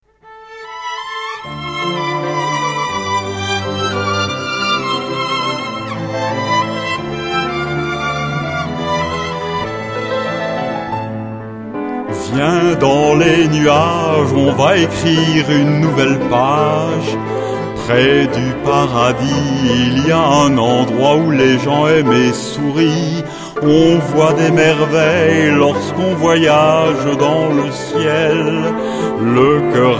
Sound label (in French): chant